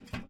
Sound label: footstep metal grill rattle barefoot male; sonniss gdc